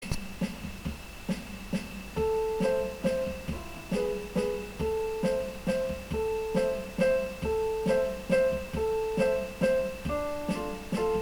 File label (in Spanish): Vals peruano